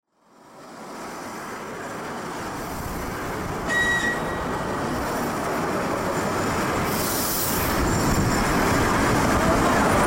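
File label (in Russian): электричка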